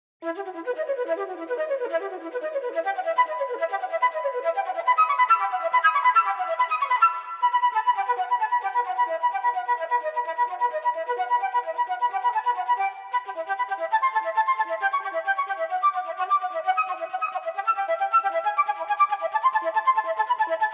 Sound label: Prelude from Violin Sonata, unaccompanied Flute; Violin Sonata no 1; Andy Findon; Johann Sebastian Bach